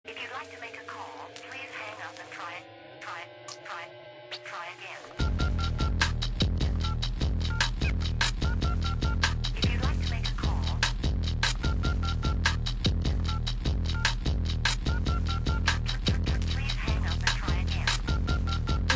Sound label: Pac bell